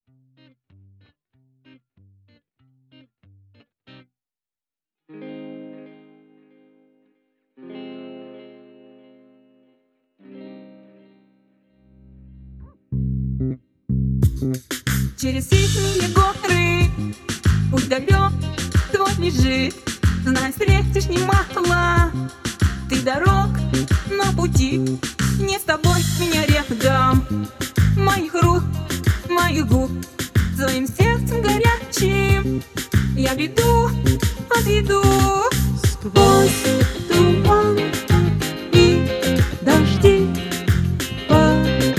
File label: acoustic